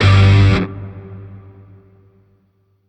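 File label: Shawzin Pentatonic Minor Chord G; Warframe; Grineer Shawzin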